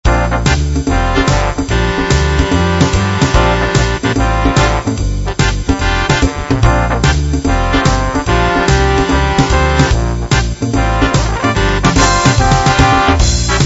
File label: big band